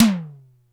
toes, Toms Electro

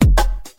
techno, Drum loops, Kick Snare

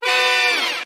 blast

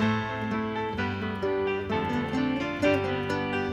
piano, Loops, Sad

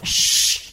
shh; ooh ahh; human

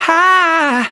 ha, SUNG; Voice; ooh ahh

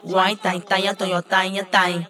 wye ka danga danga dang uh dang

